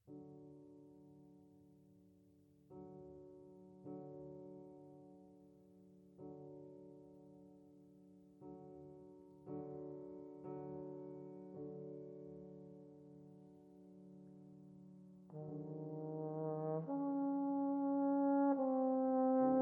Four Pieces For Clarinet & Piano - II. Sehr langsam